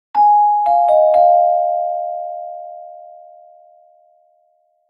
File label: Marimba